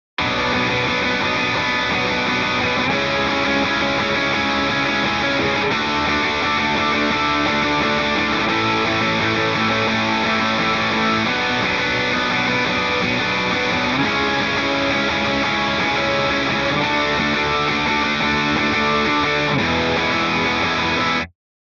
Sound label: guitar on